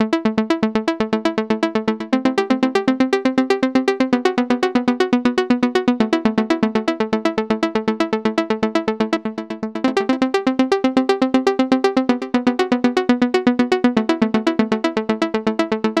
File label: Arp